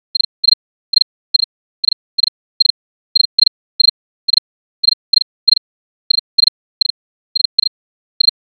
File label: cricket